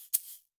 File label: SHAKER, Heart of Africa; Spectrasonics